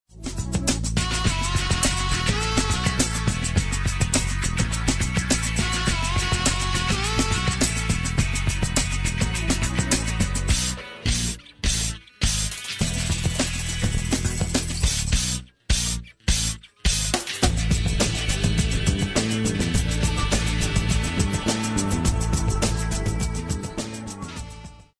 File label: pop rock